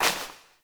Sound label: Step Dirt; Warframe; Koumei